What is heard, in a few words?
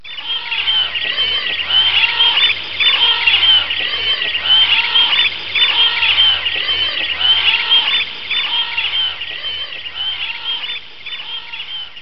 birds